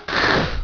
pow; 夏洛特聲音資料庫; 真說侍魂 - 武士道烈傳

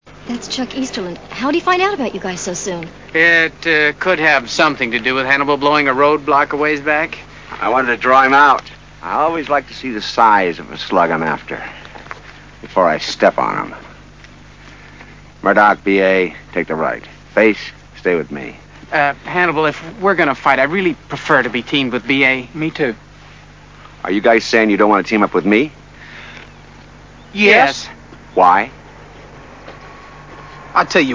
on the jazz, double bass

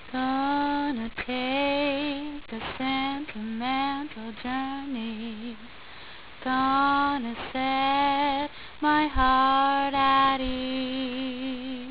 Jazz; singing a jazz song

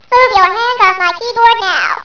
keyboard

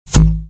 Floomp

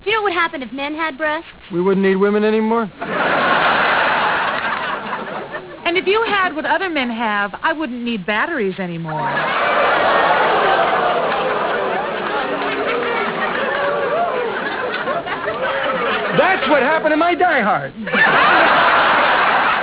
battery

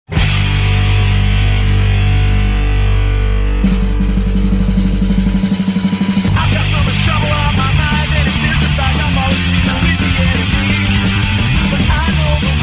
new thrash

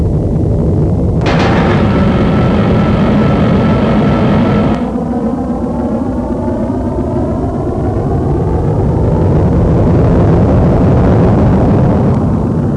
takeoff

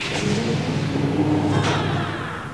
holo open

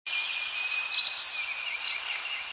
parula variant, northern parula; Sayerville, New Jersey, River Drive North; Variant on song descending at end; birdsongs

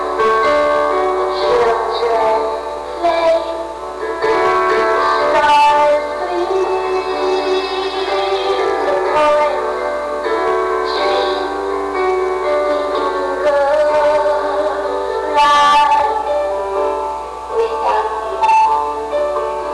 piano; RENT; Its got some funky piano going on in the background.

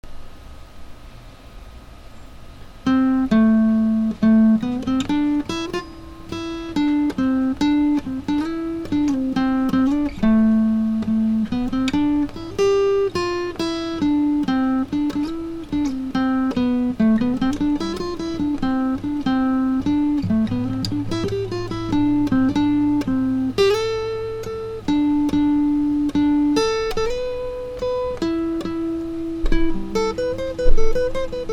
solo

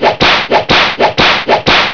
4 beats

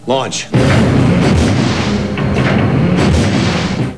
Launch

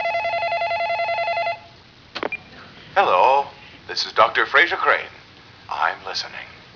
phone

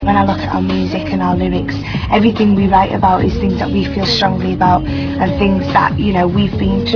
our music

